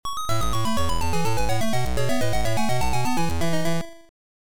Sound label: Soft Cannon Bubble level done